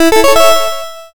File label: start